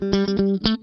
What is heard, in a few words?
Funk Guitar; Zero G - Funk Guitar